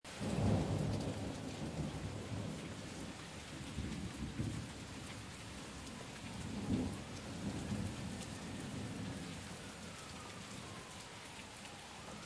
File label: rain storm thunder heavy under balcony day time traffic sirens